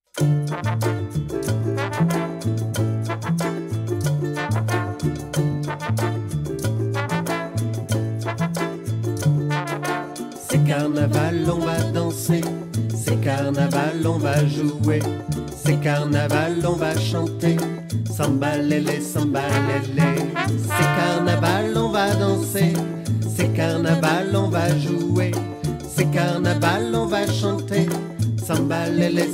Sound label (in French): Carnaval chanson pour enfants